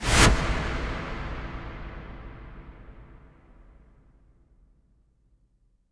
IMP WIPE, Cinematic Impact

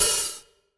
HH, Drum Mashines; HIHATS 1; EdgeSounds

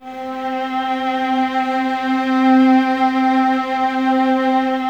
String Ensembles, Violas

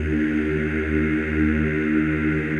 CHO ES1, Eeh; Eeh + Ehh

